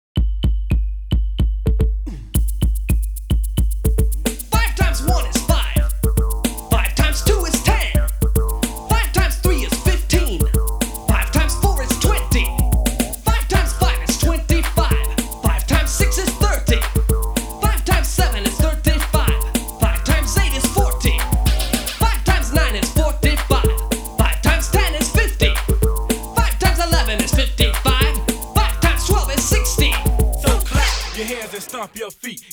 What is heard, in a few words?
numbers' beat rap